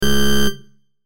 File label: error